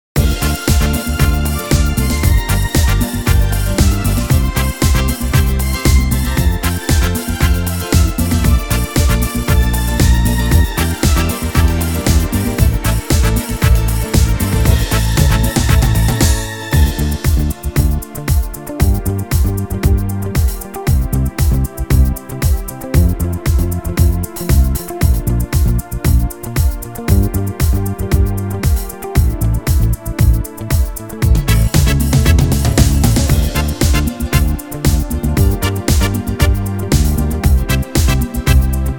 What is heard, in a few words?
Disco